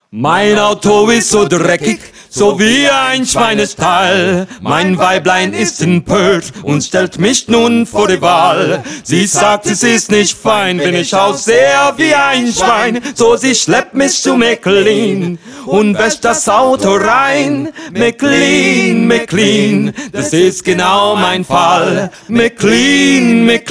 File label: jingle